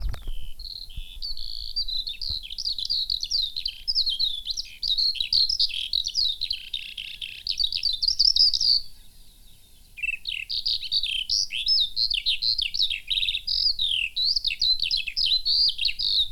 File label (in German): Feldlerche